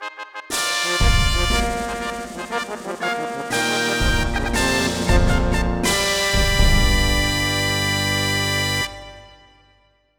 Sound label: Fanfare; Chocobo Stallion; Fanfare-Normal